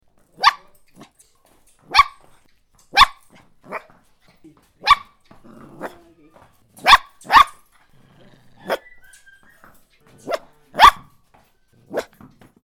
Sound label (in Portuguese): filhote de cachorro latindo efeito sonoro